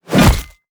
Fight Attack Hammer; Mighty Party; Android

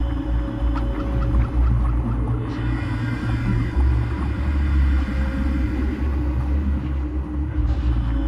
sounds music; Halo Combat Evolved; Xbox

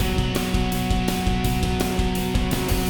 Boss Fight Phase 2 MIXED

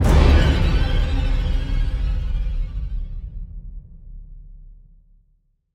Creature Scare

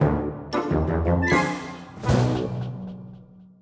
Defeat; Monster Life

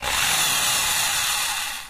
Drill Short; friday the 13th killer puzzle

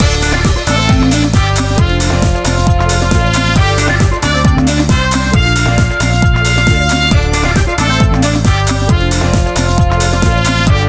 loop; Buzz! The Sports Quiz; PS2

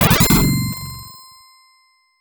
Coin; Raiden; arcade

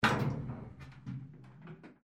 Accordion door closing; Robobeat

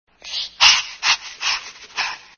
shivering; Dora

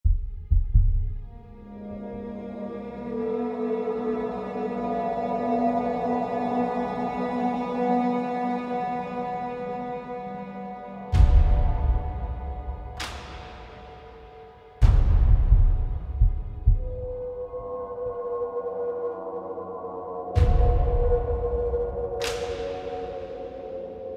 Harbor Ambience; Remnant 2; Corrupted Harbor

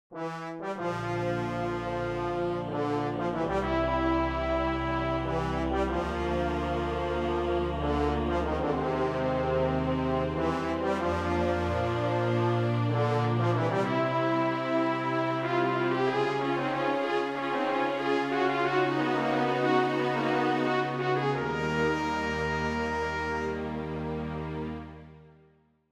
Defeat; Indiana Jones and His Desktop Adventures; reworked MIDI soundtrack

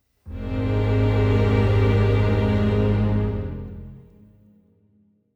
Strings; Get Even

